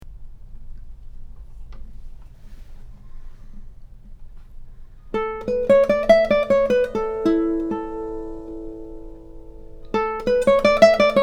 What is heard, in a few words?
Resonance; Reiter